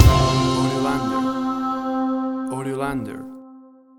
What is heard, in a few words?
An Arabian World Hit